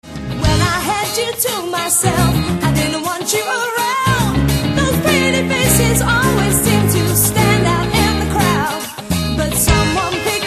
groove

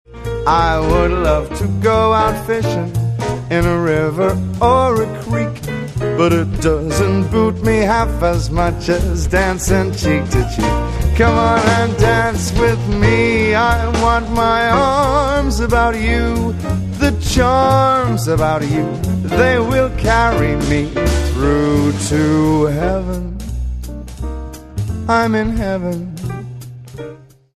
swing

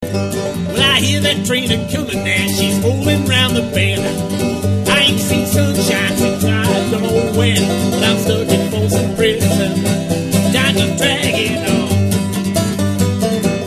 acoustic

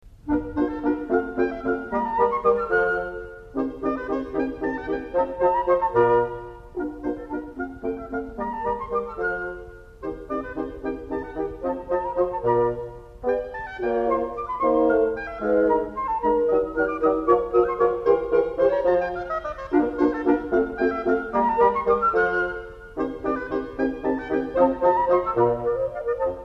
Wind Quintet

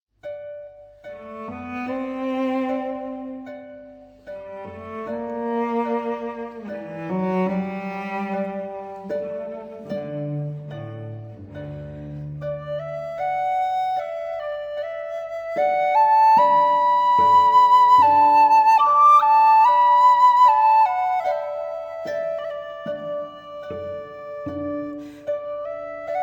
Harp

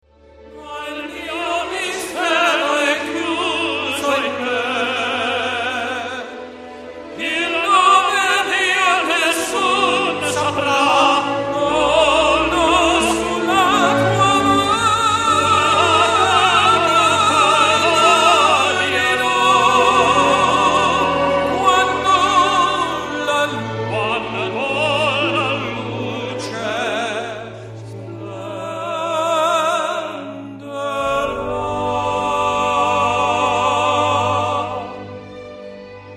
tenors

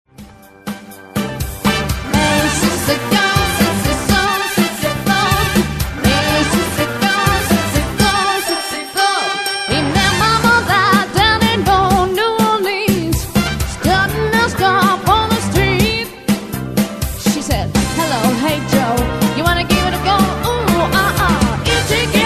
smooth & groove

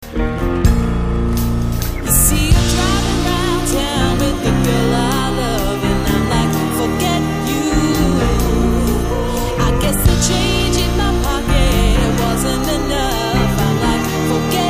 jazz

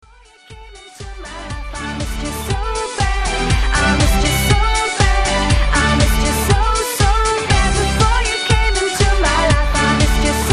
Bassline

